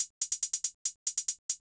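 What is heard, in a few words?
HIHAT ELECTRO 140, Techno Drum; Techno2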